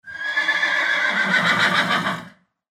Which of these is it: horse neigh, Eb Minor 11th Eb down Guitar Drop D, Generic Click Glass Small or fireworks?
horse neigh